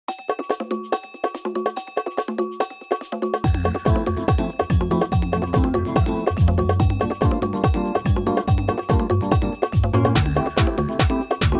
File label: Latin Vibes